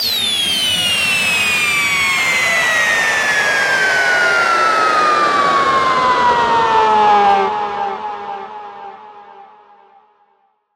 club impacts sound

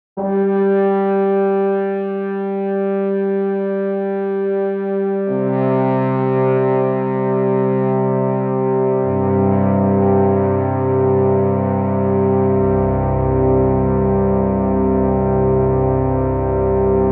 alpine volksmusik swiss

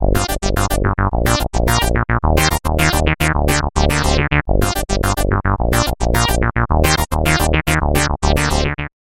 roland jx-3p syn; bassesland

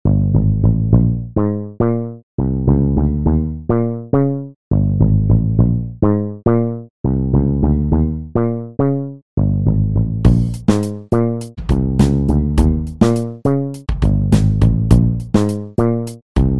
funk groove electric bass

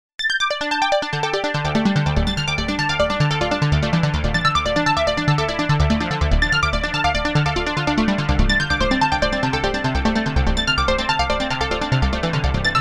lush mod arp; opx pro3

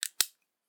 pocket pistol mag magazin; nova

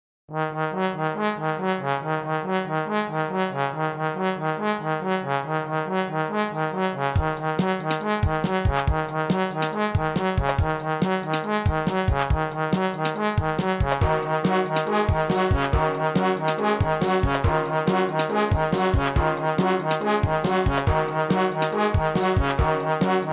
sea monsters brass trombone

